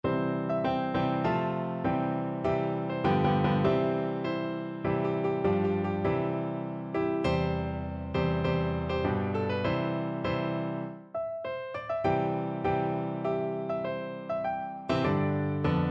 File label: anthem 2 piano